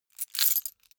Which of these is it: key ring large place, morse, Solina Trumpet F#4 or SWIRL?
key ring large place